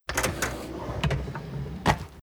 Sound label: doc open